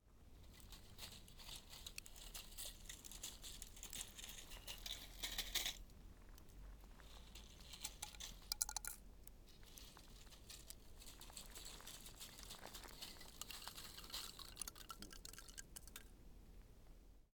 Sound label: dry bush with seed pods in hidden valley